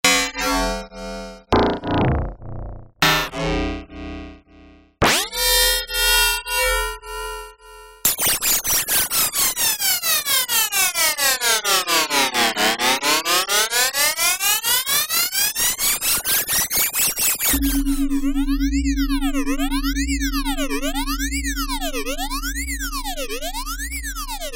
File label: fm synth; zapsplat